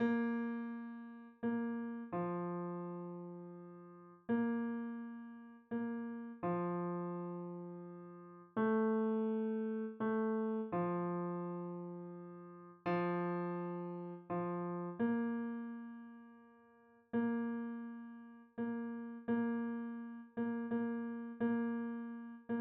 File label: Tenor; Silent Night